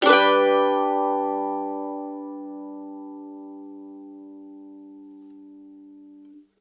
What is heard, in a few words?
CEE CHORDS G, Blues Guitar